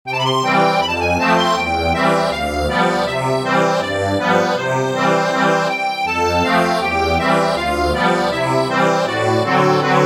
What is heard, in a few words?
ACCORDION